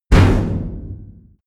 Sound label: big metal impact short